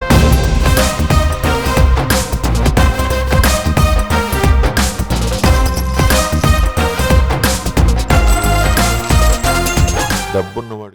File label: BGM Ringtone